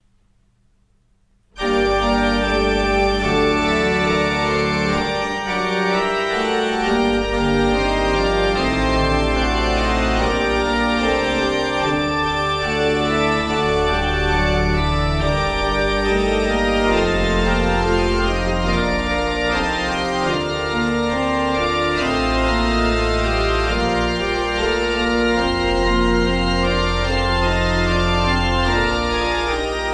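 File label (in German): Godefroy Hartmann 17 II P, pfeifenorgeln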